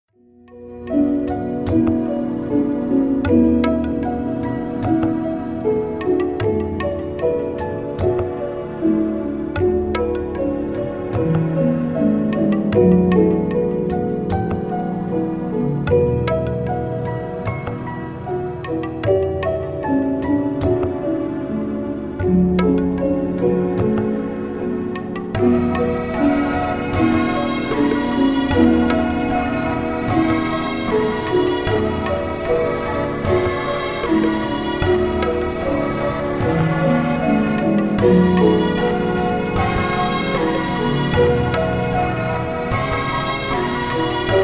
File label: spa music 432 Hz; kamasutra